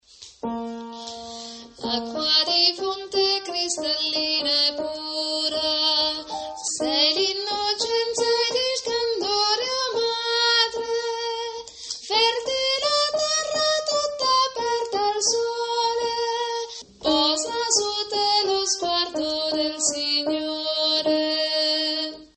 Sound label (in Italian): acqua di fonte soprani